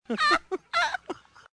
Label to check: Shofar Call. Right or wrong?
Wrong